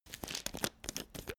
pillbox open

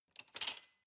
cutlery large drawer grab soft

